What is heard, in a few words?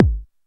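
round deep kick; Pro-Rec - Synthsonic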